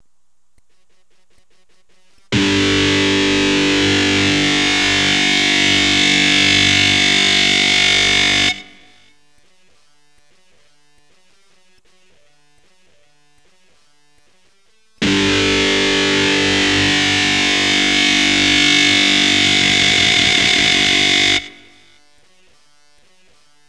E GTR POWER CHORD

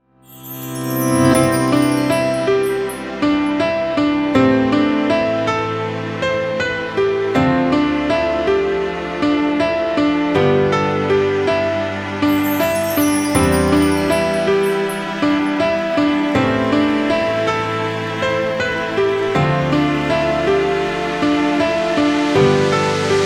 christmas lo-fi hip hop; Christmas Pre-Service

